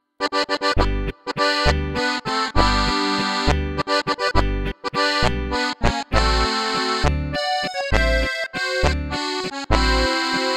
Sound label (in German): Framus AZ 10 normal Hohner Morino